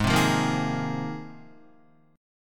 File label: Ab 6th Add 9th Ab, Guitar; strummed; JGuitar